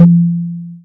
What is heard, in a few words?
deep tom drum sound f key; Duration 0:01; tom drum samples